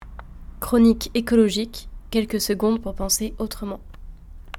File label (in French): jingle; chronique ecolo